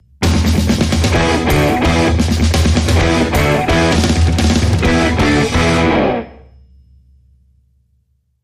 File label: Heavy Breaker